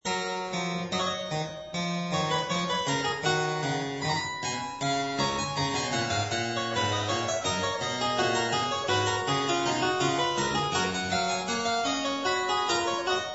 Variatio 3 Canone all Unisono a 1 Clav

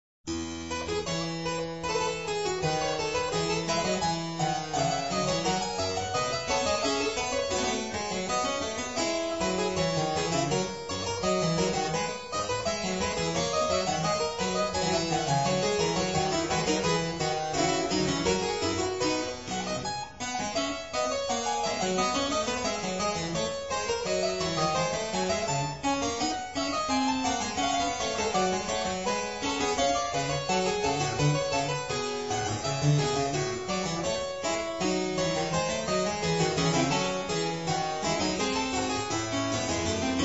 Sinfonia No. 8 in F Major BWV 794

